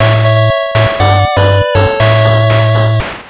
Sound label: 1-Up; Sonic the Hedgehog NES